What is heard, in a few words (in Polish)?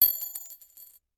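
wrzucanie pieniadza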